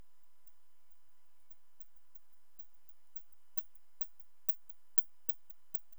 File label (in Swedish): Storre brunfladdermus, 20kHz; 20kHz-15dB